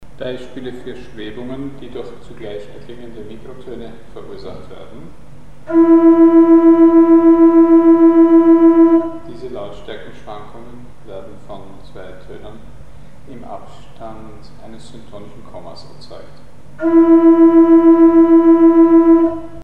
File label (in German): Schwebungen entstehend durch Frequenzen im Mikrointervallabstand; Mikrobuch